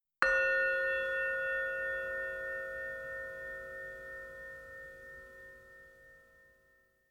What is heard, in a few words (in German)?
ZEN GLOCKE Ende der Meditation